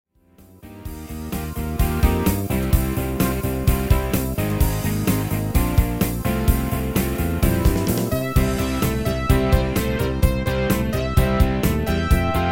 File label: Rockmix